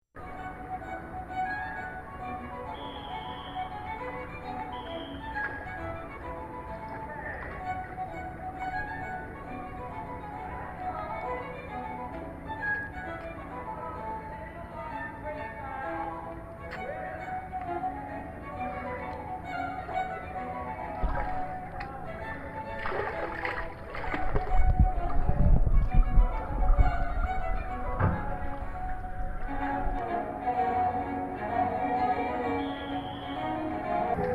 (under) water music

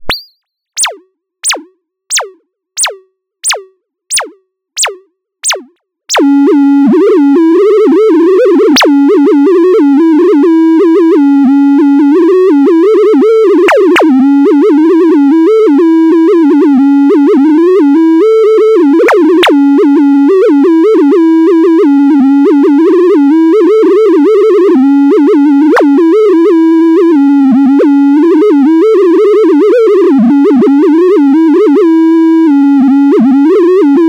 rhythm

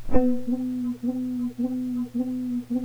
Compressed Vibrato sustain; Physical Effects

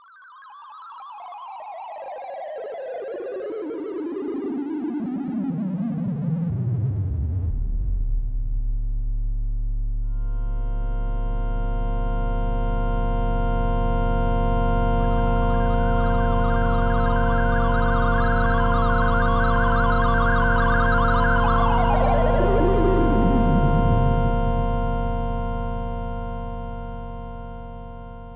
sound Logo

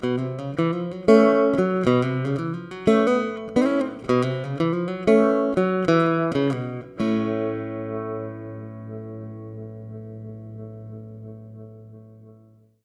Tremolo, Guitar

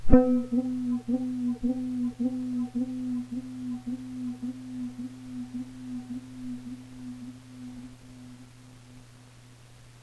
Compressed Vibrato decay; Physical Effects

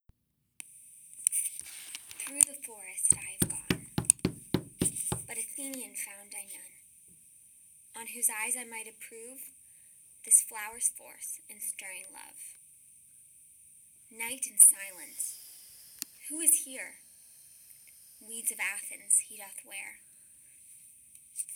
overdub

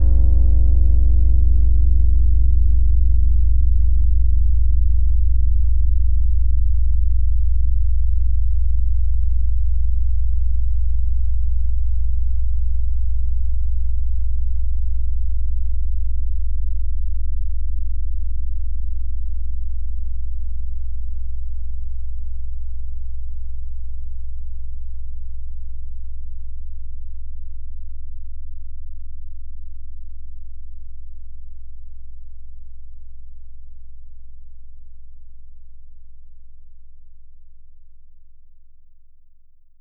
bell

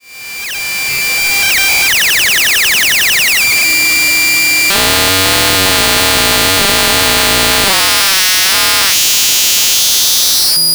fm chaos

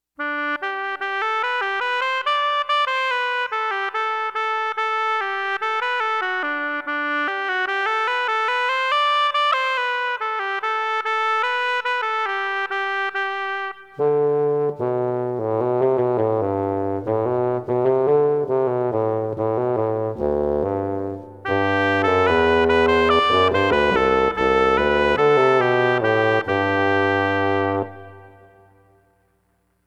oboe bassoon

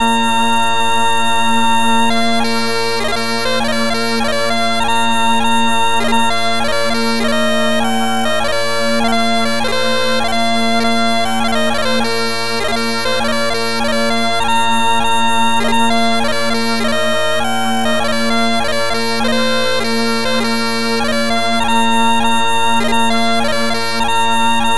Bagpipe; synth lec

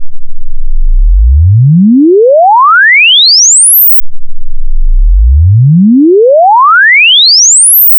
sinesweeps

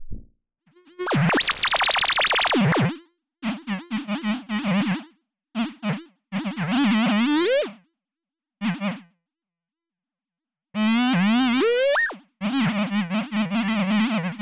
nature

